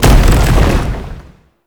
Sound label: Hammer Shockwave; Ultimate; Unairu; Focus; Ultimate Abilities